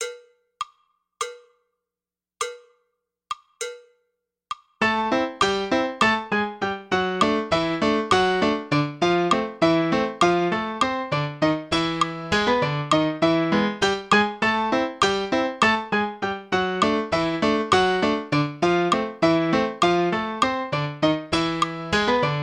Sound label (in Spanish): estudio slow